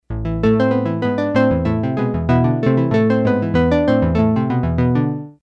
epiano

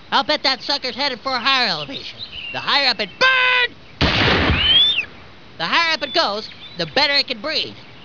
bird; A bird interrupts Uncle Jimbo's speech.

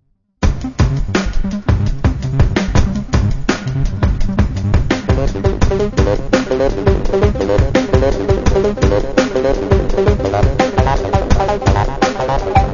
trance, digital horn, guitar

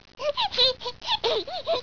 chibi giggle

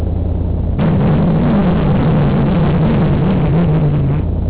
twist

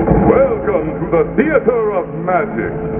magic sound

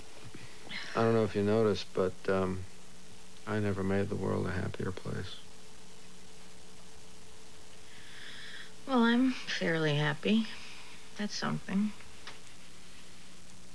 happy

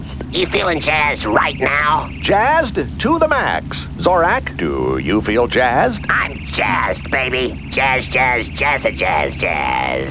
jazzed

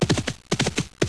gallops

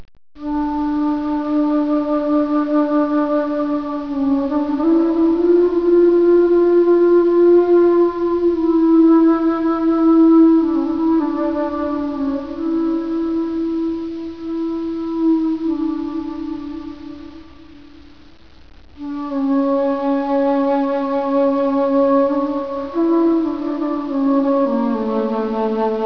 Flute

